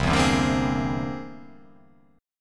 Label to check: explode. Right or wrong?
Wrong